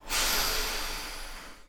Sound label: Air, Train; OpenBVE; Cl313 Silverlink